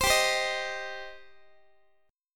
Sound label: G Suspended 4th Sharp 5th, Guitar; Gsus4#5 strummed